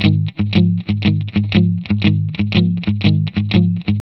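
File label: 120, Vintage Blues Guitar rhythm; USB Soundscan vol.22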